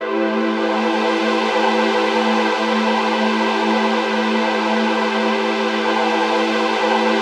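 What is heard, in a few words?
chord pad, Pads; Best Service; XX Large - Pads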